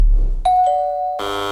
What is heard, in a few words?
Door Tone